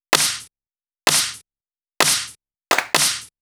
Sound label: Male Clap Mix, 128 BPM; Vengeance Samples; Vocal-Hook Kits; Song Kit